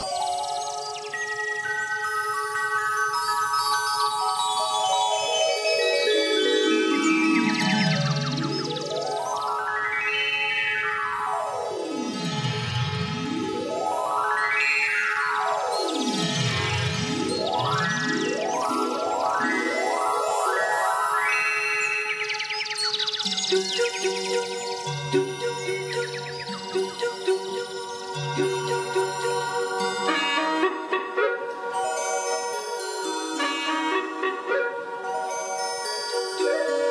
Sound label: Folk Dance